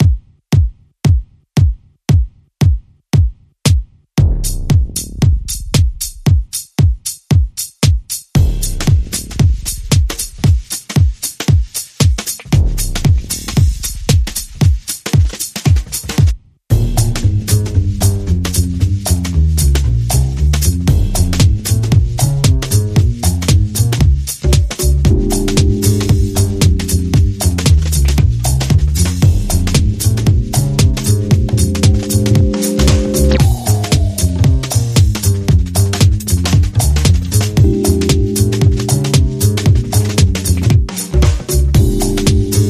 Jazzcat Underscore

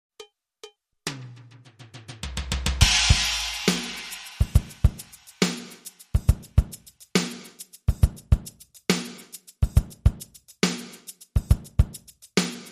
Afro Cuban Loop Drum Kit Only

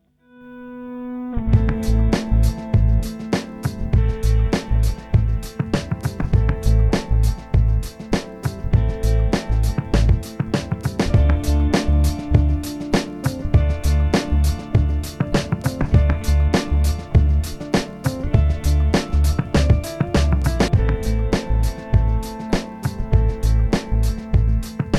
Tension Risin Spooky Mystery Beat

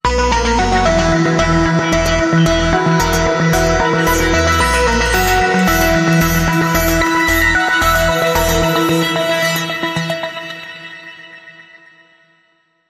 Glitch Sting

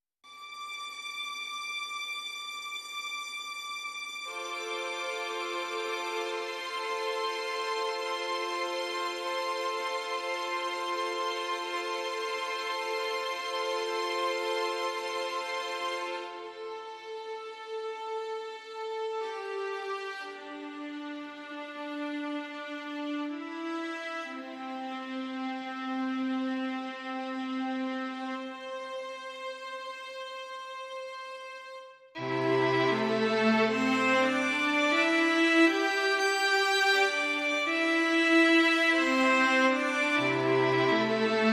Strings

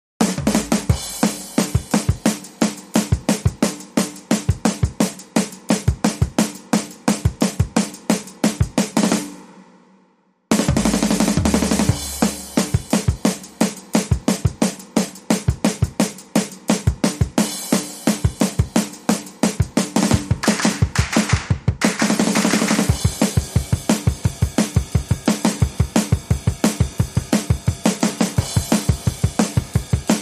Drums with Claps

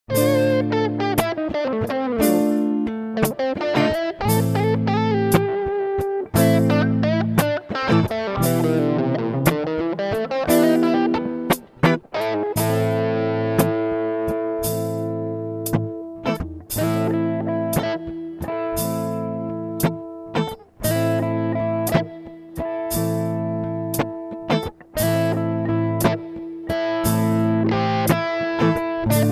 Riff Blues

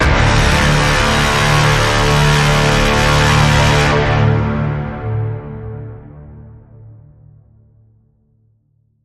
Bend, D

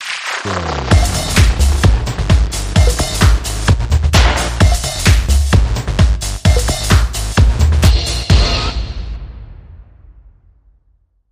House Of Noise

